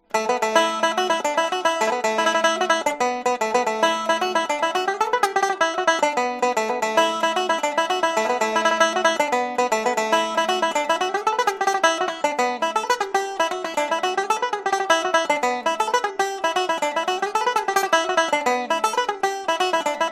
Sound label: tenor banjo